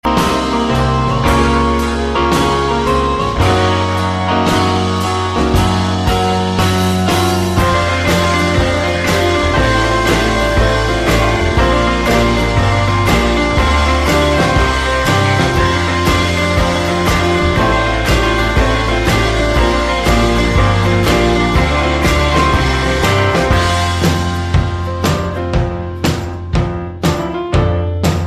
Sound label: Dulce Alarm